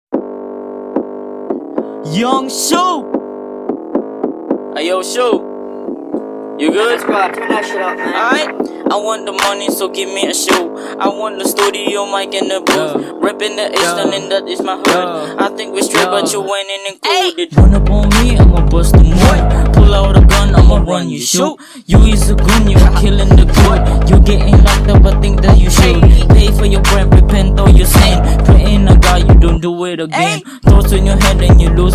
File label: drums